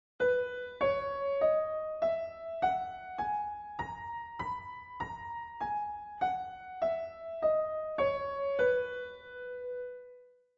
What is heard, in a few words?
B Scale Piano; California Music Conservatory